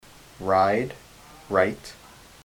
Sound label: ride right